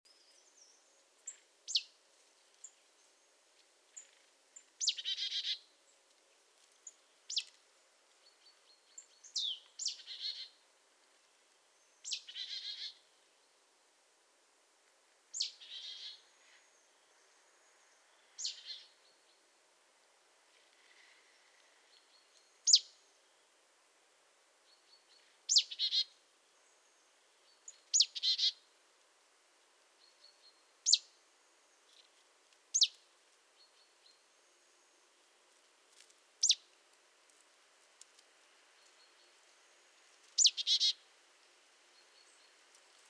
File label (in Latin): Poecile palustris; SETE